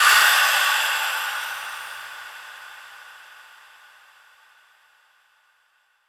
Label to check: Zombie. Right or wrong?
Wrong